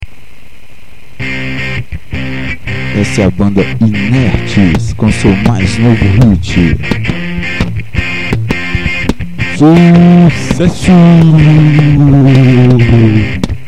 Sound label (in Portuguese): musica